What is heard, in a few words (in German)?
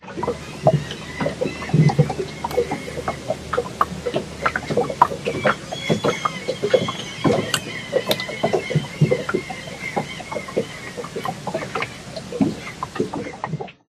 Folkeboot gluckern im Hafen; mono